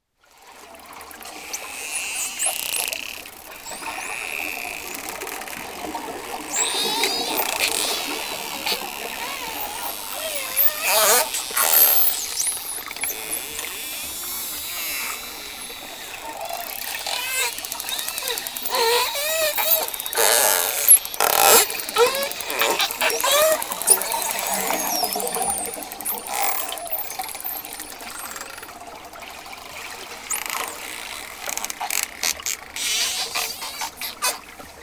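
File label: mysterious sounds of dolphins, nature sounds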